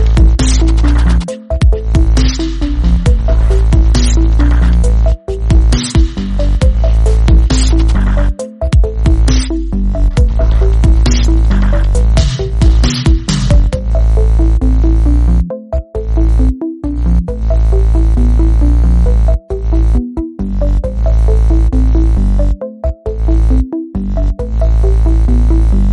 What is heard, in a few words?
dubstep